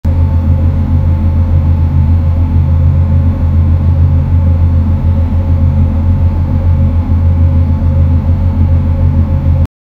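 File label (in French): démarrage d'un très gros moteur, marine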